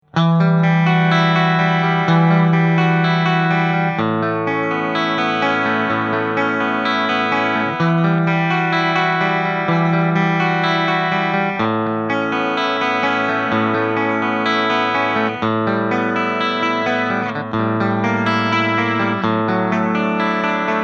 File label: Clean Bridge Single